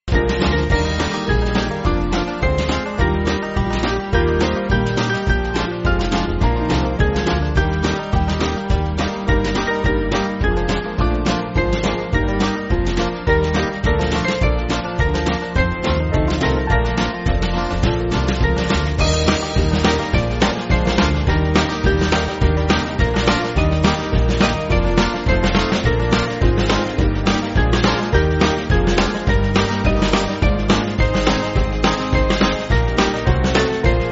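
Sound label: Band, Backing Files for Churches; McGranahan; 4/Eb-E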